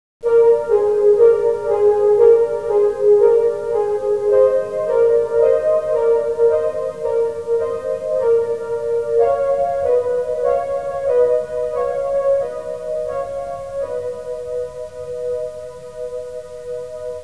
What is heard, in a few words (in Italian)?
audio suonerie musica mistica